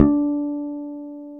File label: P-BASS D2; Sonic Reality - Vintage Timetraveler